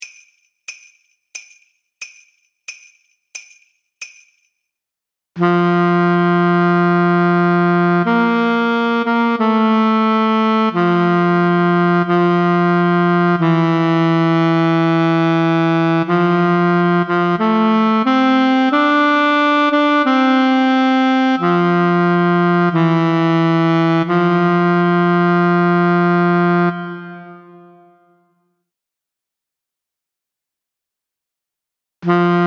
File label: Swing Low Sweet Chariot Alto Sax; Duets Clarinet Saxophone